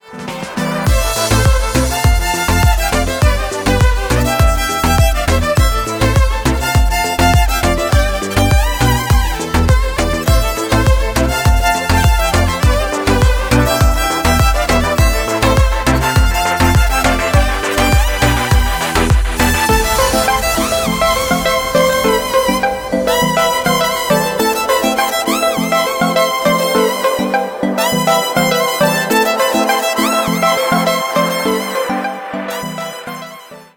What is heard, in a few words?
electric violin cover